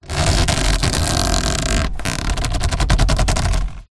transport car hand grasp steering wheel leather squeak